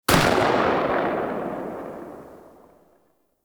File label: Shotgun Tail; Warframe; Tenno; Alchemist